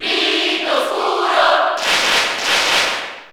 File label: Cheer Italian; Dark Pit; SSBU